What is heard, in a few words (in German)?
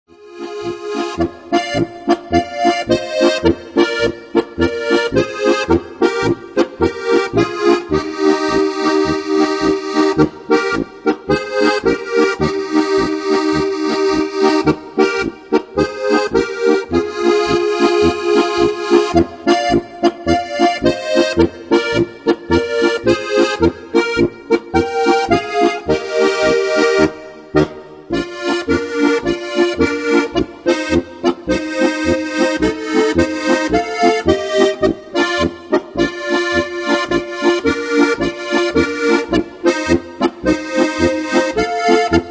Polka